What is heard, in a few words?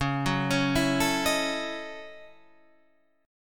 Gb Minor 13th Db